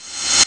hhat blast; Amiga Soundtracker Sample Packs

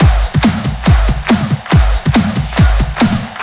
Break Beats, 140 BPM

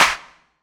Clap, Drum Percussion Single Hits; Zero-G; Nu Disco

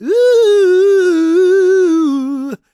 GOSP MALE, SOLO; Spectrasonics; Vocal Planet

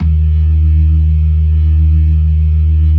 LO MAN V L, ORGAN LOWER MANUAL; Keith Emerson Signature Series

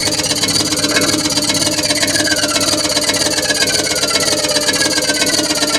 MACHINES

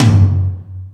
Toms, E-MU Pro-Cussion

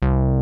MINI BOUNCE, MINIMOOG

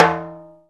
CNTR STRK, Timbale Battery

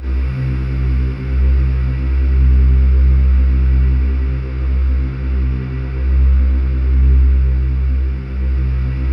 C1, Synth Leads

